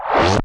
chargeup

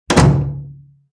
Door close

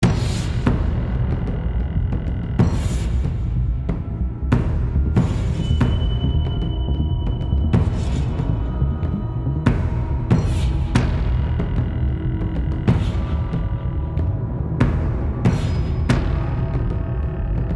Action (Loop)

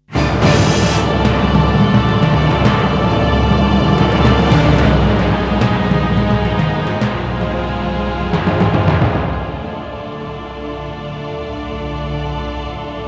Death Music; SpellForce: The Order of Dawn

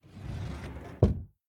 Hiding Spot Enter Door; The Inn

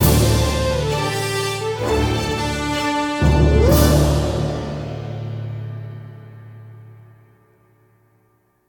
win

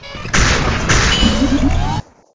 Teleporter Open

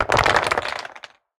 Combat Blocks; portal quest; android

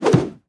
Tnt Box Popup

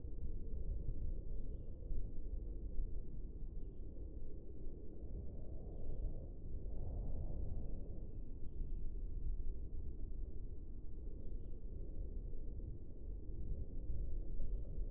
amb springs int; simmer springs int

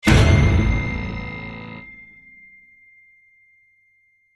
Shock Stinger

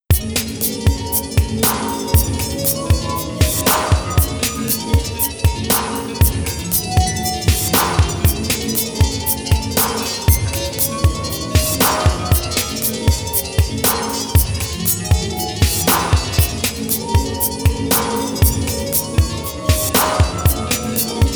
Level Start; Apprentice; CD-i

